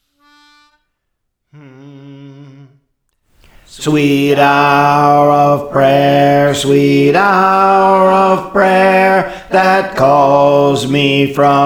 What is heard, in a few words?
Bass; Sweet Hour of Prayer; Praise For The Lord Training